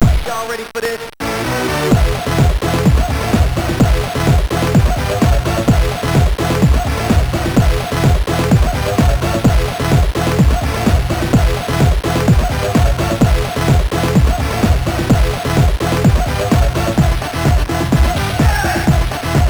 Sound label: Acid Party, MOS6581R2